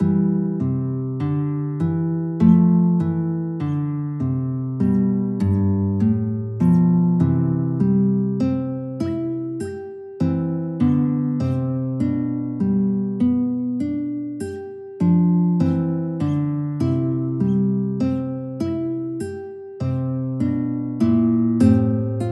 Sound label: maestoso, guitar; giuliani